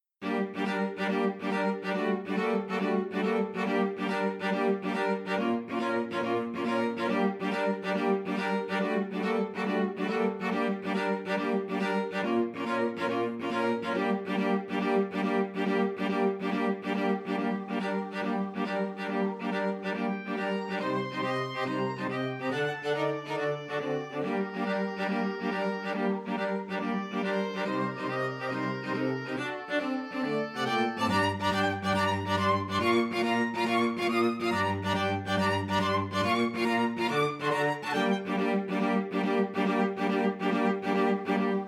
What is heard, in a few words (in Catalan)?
Galop